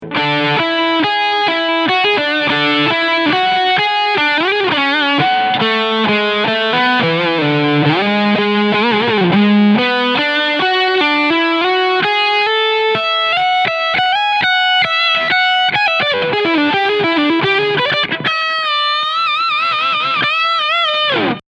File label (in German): Lead Sound Steg PU; Harley Benton R 446